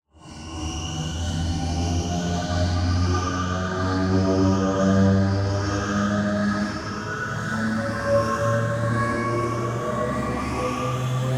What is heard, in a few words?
rise; sonniss gdc